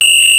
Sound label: bell; E-MU Formula 4000 Series Vol. 1; Hip Hop Nation; Bonus Perk